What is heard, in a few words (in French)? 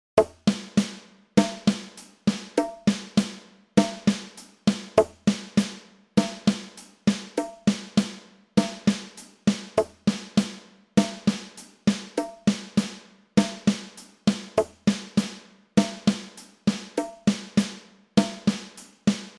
mise en place main 50bpm